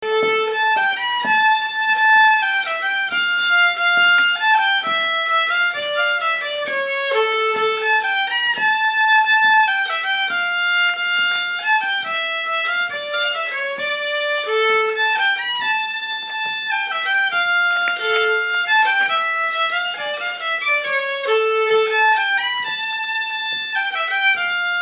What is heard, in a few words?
halling